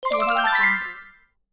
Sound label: success